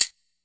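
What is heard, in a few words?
classic hip hop shaker; STYLE KITS (Hip Hop, Etc); Classic Hip Hop Mega Kit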